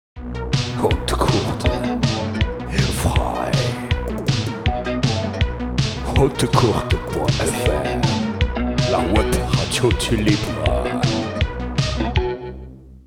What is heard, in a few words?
jingle